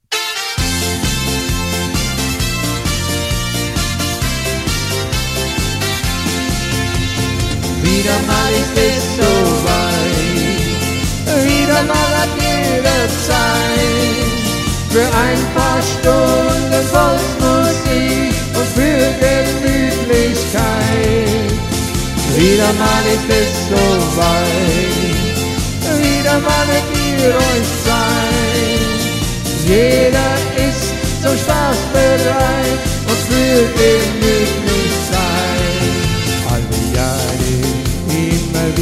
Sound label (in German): Volksmusik